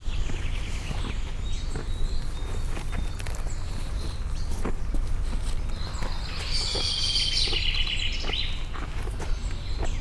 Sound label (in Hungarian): eszik, majd elmegy, kétpúpú teve; szegedi zoo 2011